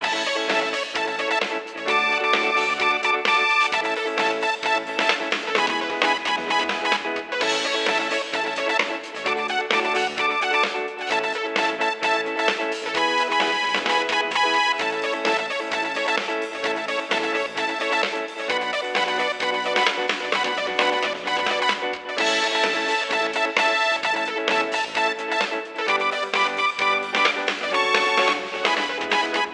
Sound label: Jukebox 80s Dance